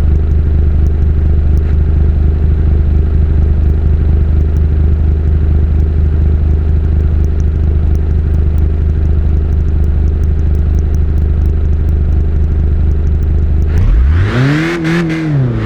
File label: idle, vehicles cars; lwcars